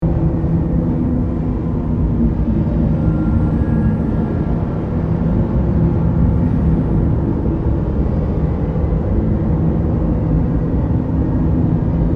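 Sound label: Ambience Elevator Shaft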